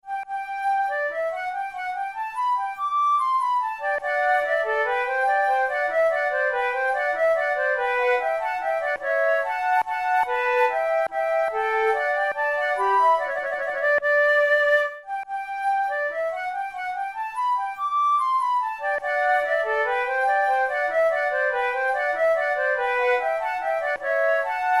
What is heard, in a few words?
courante